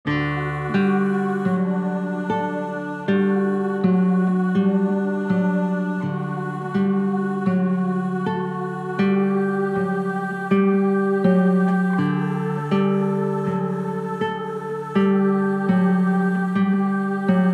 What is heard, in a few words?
Berceuse Ac Guit Vox